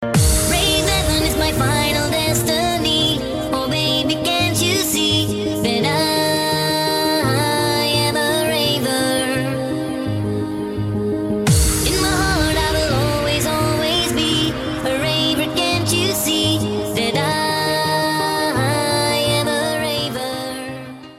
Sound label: Rave